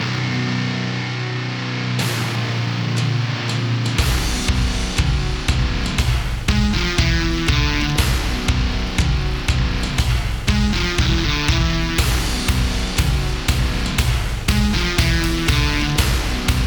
Doomed 120BPM; Grand Battle

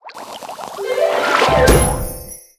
Bottle Jump To Meter

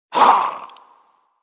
Damage Voice, Scorpion King; children of morta

